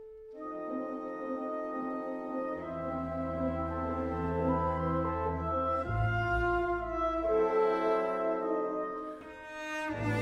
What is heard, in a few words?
cello